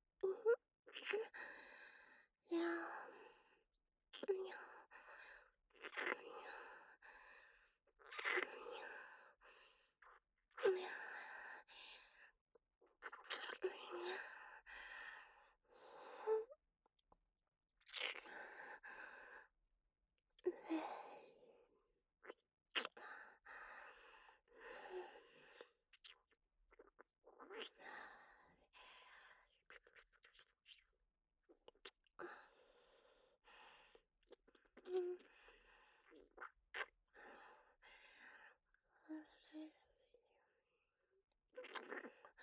キス、左右耳舐め, ASMR; アドリブ集

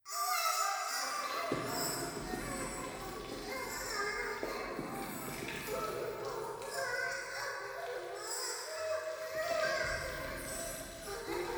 風呂 シャブ喘ぎ 触手, ASMR

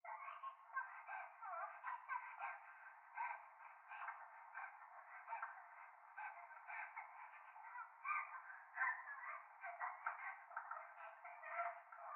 トイレ 可愛い喘ぎ 電車

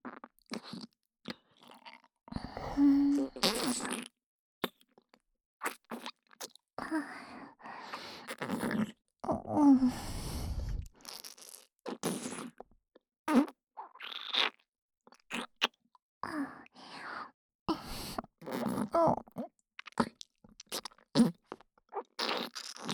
ツンデレ ねっとり右耳フェラ; フェラ素材

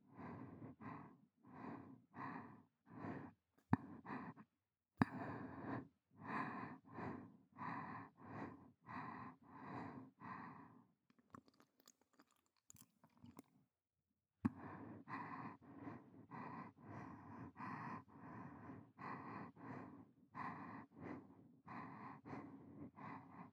つなぎ呼吸; ちんぽ応援トラック

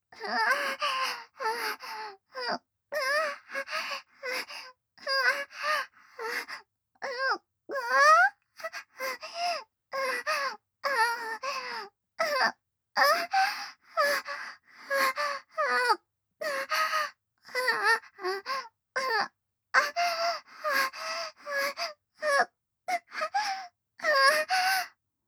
喘ぎ, ASMR

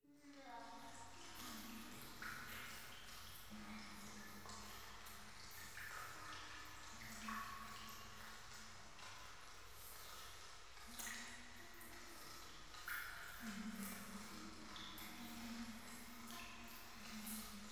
教会 フェラチオ 雨上がり

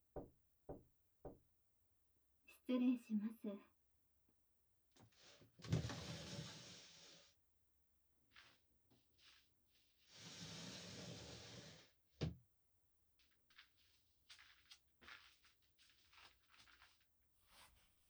耳“奥”舐め犯し ＆ 手コキ; 耳奥舐め犯しマスターお姉さんの耳“奥”舐め犯し＆手コキ

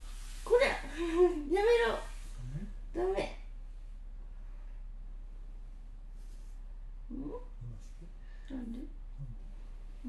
フェラ※男性声多

